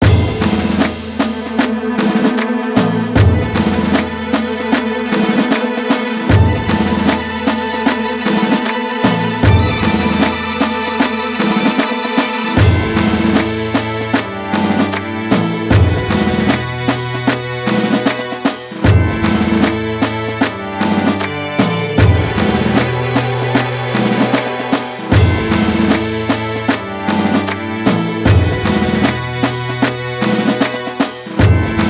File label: Military Cadence; Totsuzen Kaijin ni Natta Ore ga Mahou Shoujo o Otosu Hanashi